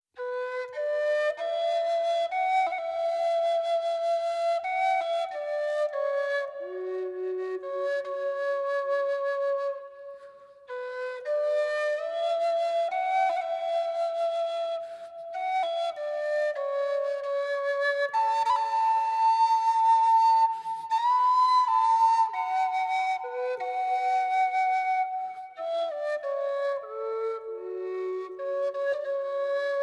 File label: Melodious Flute; Genshin Impact